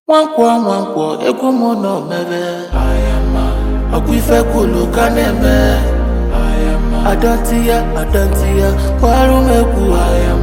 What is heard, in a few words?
Acoustic Version